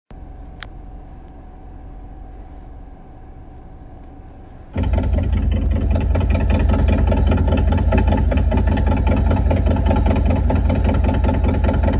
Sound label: new cams in wideglide, cams